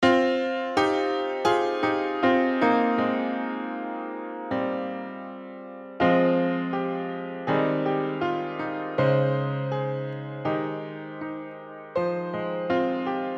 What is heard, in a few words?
theme piano